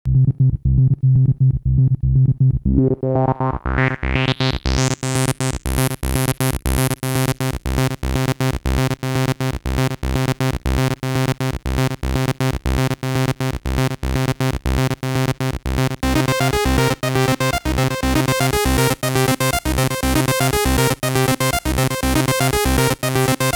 JunoDS88 SAW Filter Sequenz; OB2

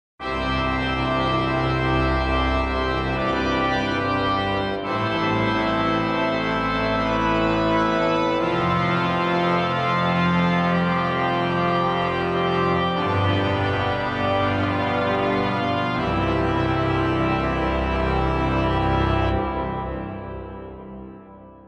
Church Organ; Magix Acid Pro 10

